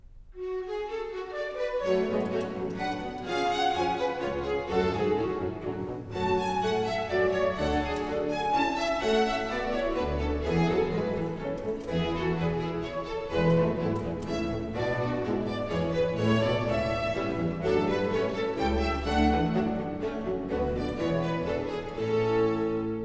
Menuet; Klassika; Handel Masterworks

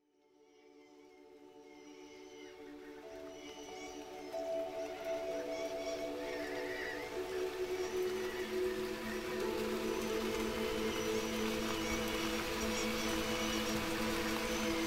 Soothing Rain & Birds Singing

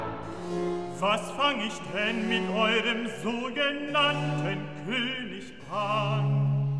Recitativo; Pilatus Was fang' ich denn; Klassika; Handel Masterworks

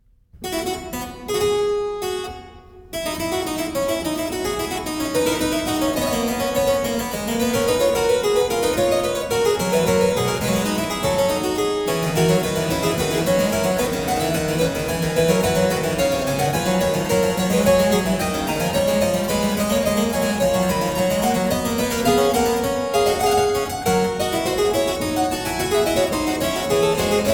Fuga, Keyboard Works, The Well-Tempered Clavier; BWV 870 C II; Klassika; Bach, Johann Sebastian

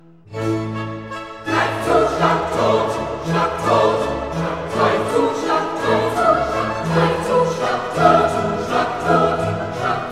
Coro; Handel Masterworks

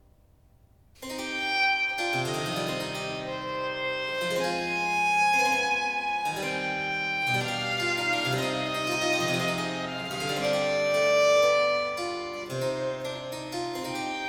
Sonate 7 in D minor 3. Sarabande, largo; Arcangelo Corelli; Corelli - Complete Works; Klassika

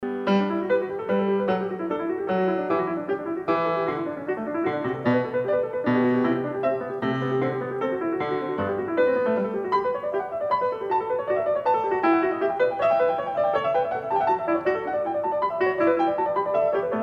Bach Goldberg Variations

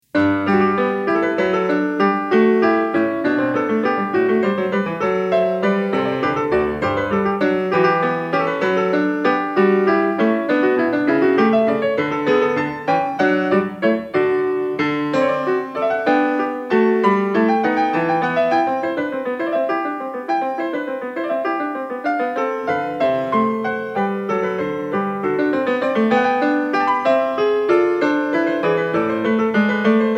Partita for keyboard No. 5 in G major, Passepied; Bach, Johann Sebastian; BWV 829

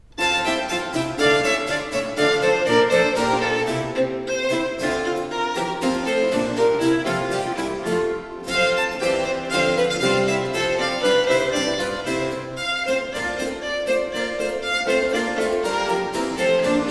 Sonate 10 in G major Tempo di Gavotta, presto; 3. Tempo di Gavotta, presto; Arcangelo Corelli; Corelli - Complete Works; Klassika

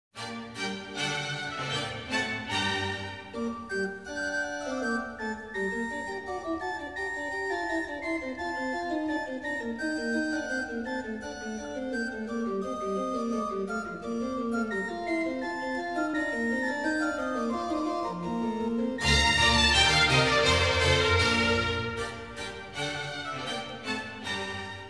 Organ Concerto in G minor - Allegro; Op.4 No.3; Handel Masterworks; Klassika

